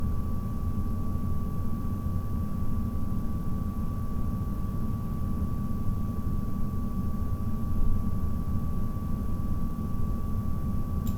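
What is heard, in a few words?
1000 RPM Push, fan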